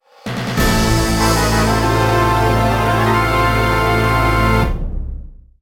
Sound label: Game Over; Castlevania Anthology; Castlevania - Portrait of Ruin